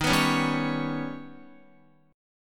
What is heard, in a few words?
Eb Augmented 7th Eb, Guitar; strummed; x,6,9,6,7,8; 4E,4A,5D,5G,5C,6F